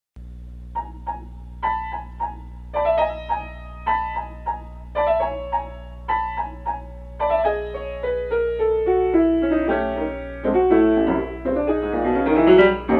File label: Piano Prelude